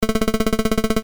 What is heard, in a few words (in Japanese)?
会話